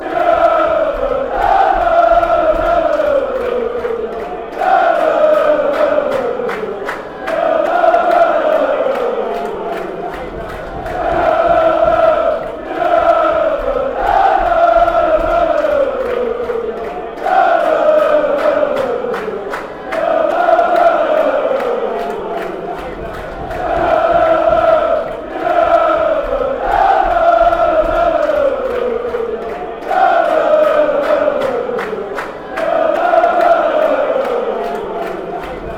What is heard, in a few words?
lalalala fanchants